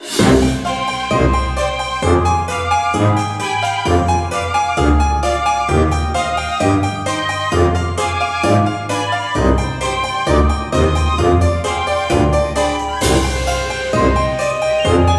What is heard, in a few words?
Menu Music; Fat Princess; ps3